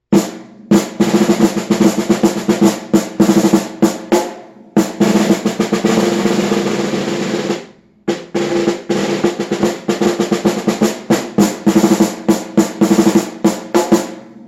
Percussion Song

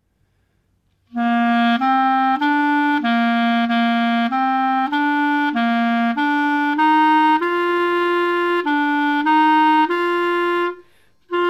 Clarinet Song

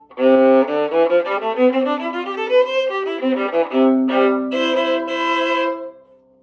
Viola Scale

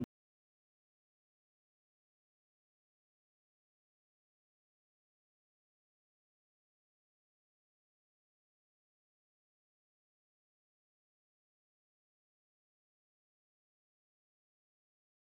Baritone Note

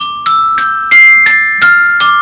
Percussion Scale

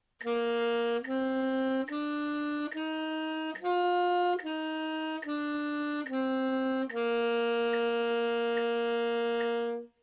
Sax Scale

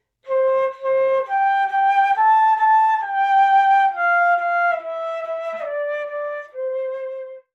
Flute Song Twinkle

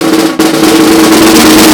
Percussion Note

